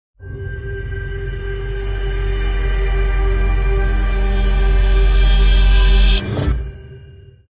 Rising Logo

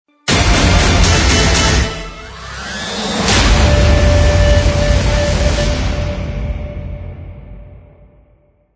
Hybrid Trailer Stinger

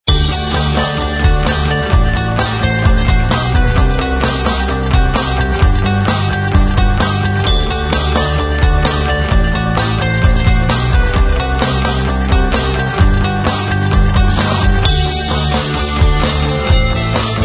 Ice cream loop

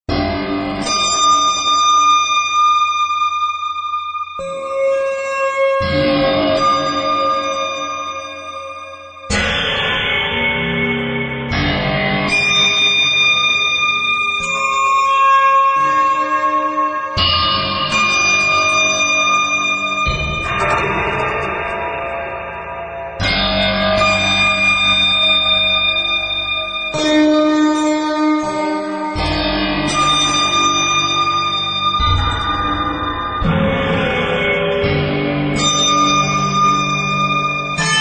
Apocalyptic Musical Crucial Moment String

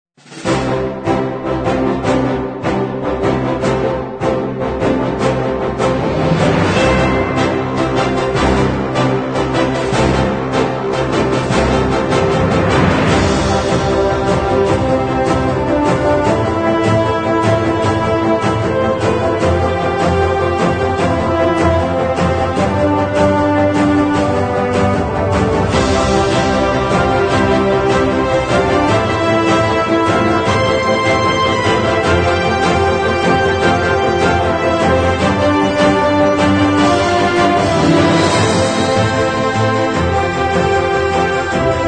Award Show

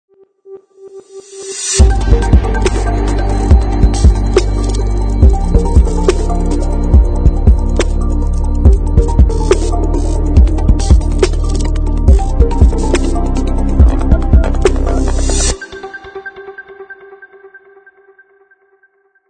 Corporate Technology Digital Logo; 音乐素材 企业科技数码 Corporate Technology Digital

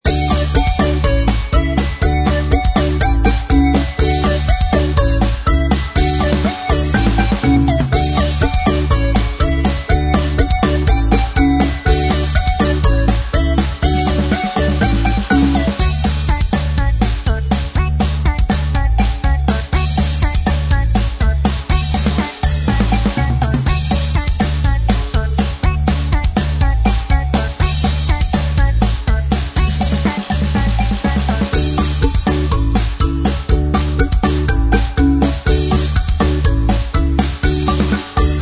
Happy Times With Synth Lead

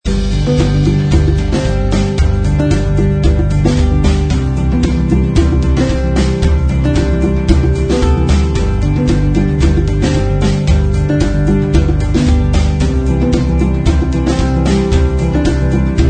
Chorus No Piano; 音乐素材 夏日温馨套装 Warm Summer Kit

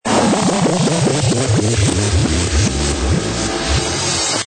break, Corporate Identity Intro

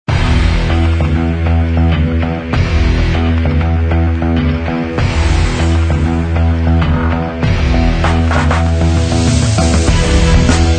Break

